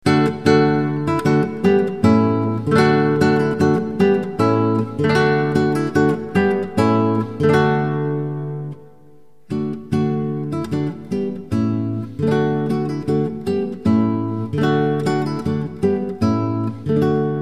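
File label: passacaglia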